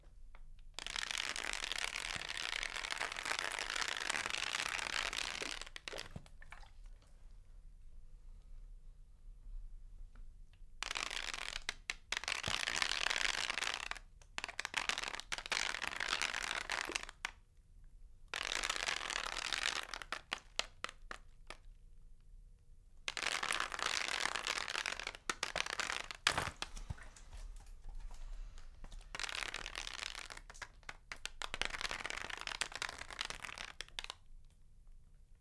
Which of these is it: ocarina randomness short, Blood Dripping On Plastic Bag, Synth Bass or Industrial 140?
Blood Dripping On Plastic Bag